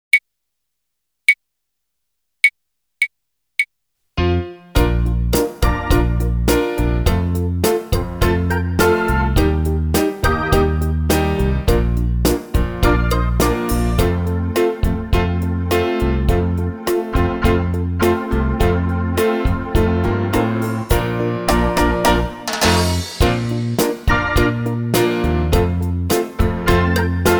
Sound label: Cha Cha